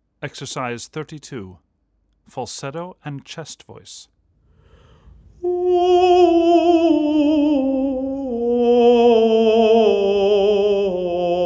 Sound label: falsetto chest voice; 男声ファルセットの発声サンプル音源を掲載します。胸声とファルセットのつなぎがわかりやすいと思います。